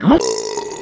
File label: gulp; vortex; fartblaster